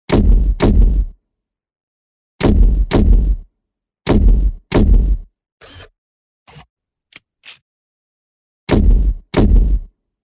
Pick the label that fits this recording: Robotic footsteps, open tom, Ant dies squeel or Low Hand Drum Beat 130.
Robotic footsteps